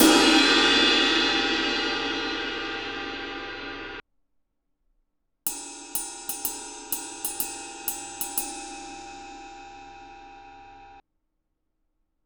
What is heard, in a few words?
22 inch ride crash ride bell; cymbal; Matt Nolan; Custom